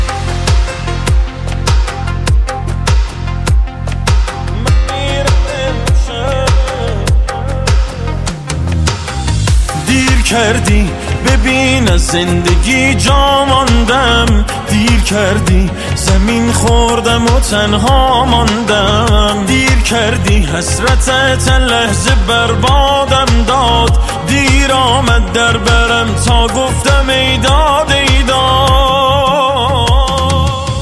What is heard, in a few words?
Ringtone Mobile